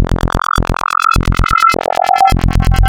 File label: Spectral, wavetables; Architecture 32